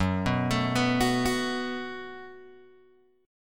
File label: F sharp Minor Double Flat 5th F sharp, Guitar Open G